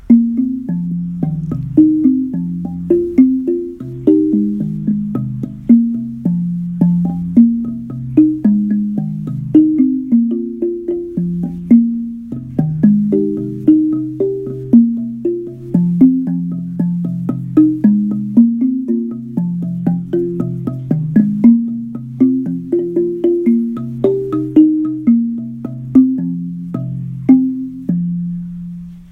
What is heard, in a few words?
Hardwood Drum